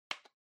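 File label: Portable Stereo Remote Control Button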